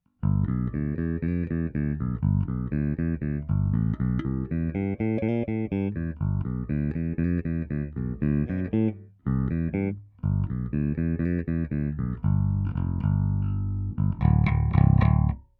Voice: classic, snímače S - S, Schecter SLS Elite-5 baskytara

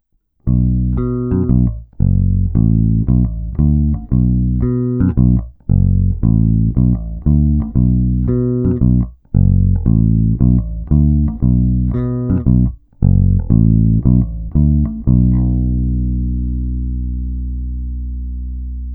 Černá – krkový snímač; Xotic XJ-1T; Černá – oba snímače paralelně

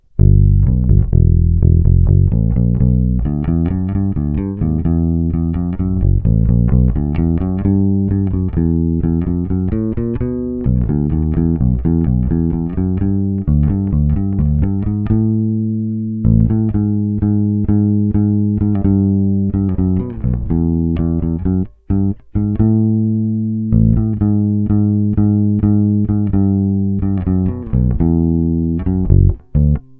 Originální snímač, Precision bass; Porovnání originální vs. Tony Franklin snímač; Téma: Jay Turser Precision bass JTB-400C-BK-A-U

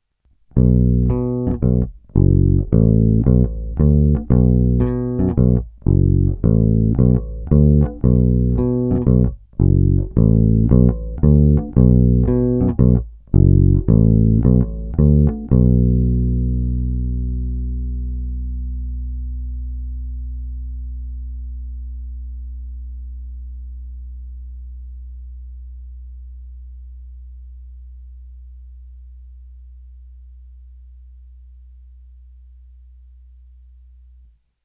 Hráno nad snímačem RW, Lakland 44-64, Baskytara; Bob Glaub

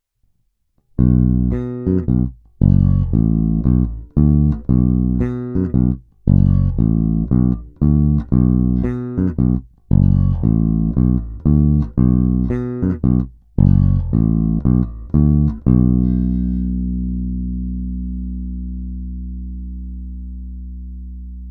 Greco TB-750 Thunderbird Bass, Oba snímače

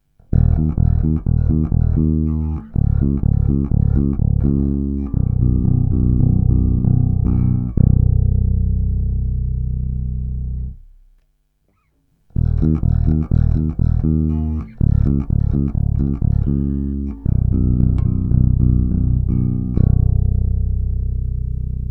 Použití struny H aktivně, Baskytara; Aleš Vychodil AVBS5

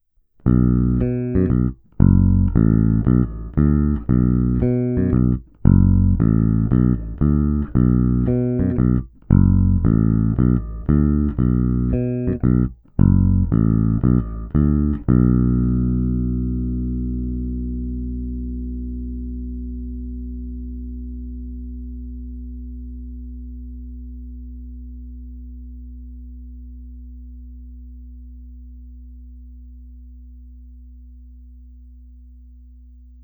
Cívka 1 a 2 paralelně – kobylkový a prostřední snímač; celkem hodně blízko obvyklému zvuku ala Music Man StingRay; Ernie Ball Music Man BIG-AL

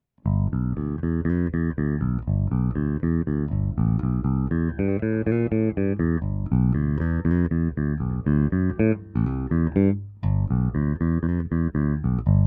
Voice: classic, snímače H - H, Schecter SLS Elite-5 baskytara; v nastavení, ve kterém přišla z výroby

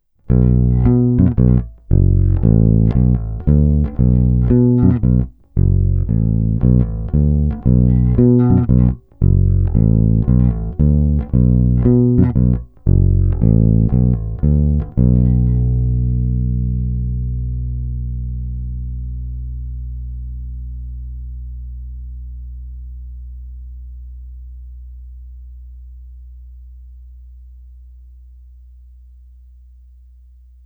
Snímač u krku (FW), Mike Lull V4, baskytara; Hráno vždy nad aktivním snímačem

